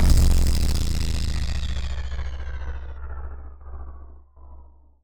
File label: Impact; impact samples